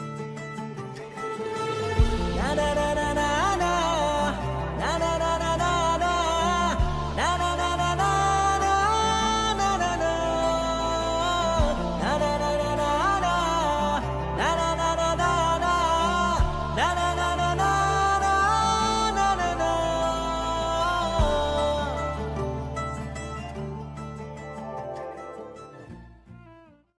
LOVE BGM

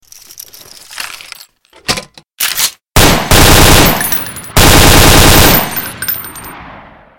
GUN SHOOT M 16 RINGTONE

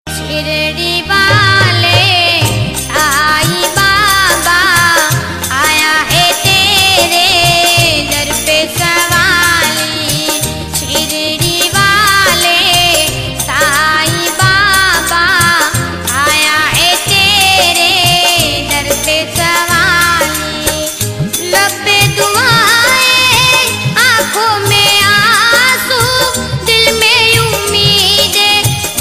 KIDS VOICE RINGTONE

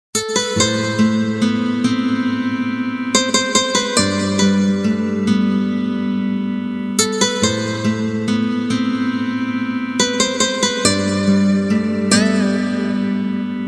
NICE GUITAR RINGTONE

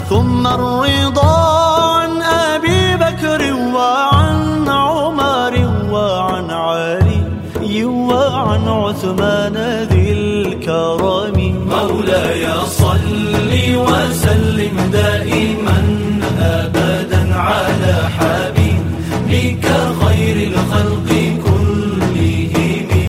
ISLAMIC RINGTONE

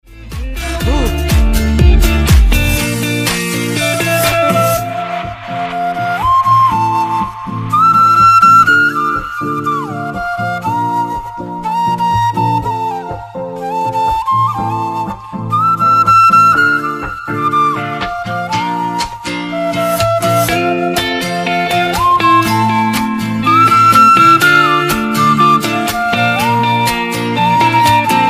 FLUTE RINGTONE

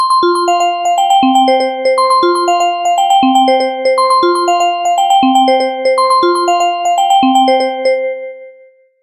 IPHONE RINGTONE

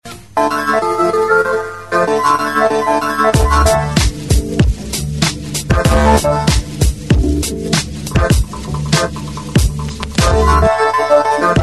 MOTOROLA RAZR V3 RINGTONE

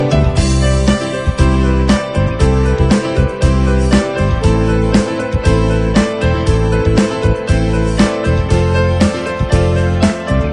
INSTRUMENTAL RINGTONE

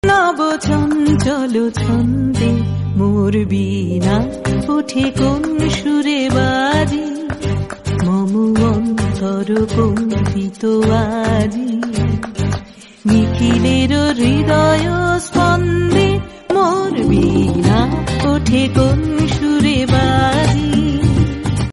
BENGALI FOLK SONG RINGTONE

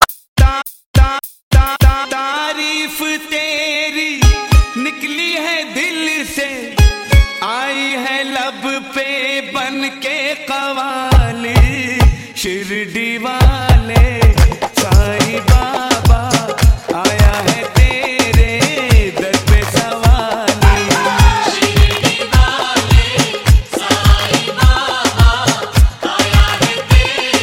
REMIX RINGTONE; SAI BABA

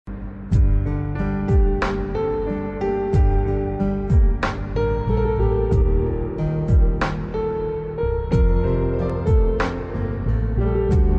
FLUTE RINGTONE

